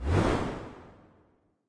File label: swish; Smite; Zhong Kui